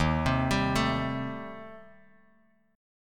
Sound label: Eb 6th Flat 5th, Bass Standard, Eb6b5 chord; Eb6b5 arpeggiated; 11,15,17,14; JGuitar